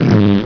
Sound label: chair